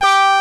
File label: ANALOG COMBO; OMI - Universe of Sounds